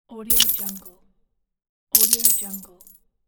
coins

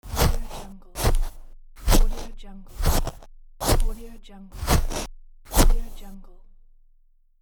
walking on the snow

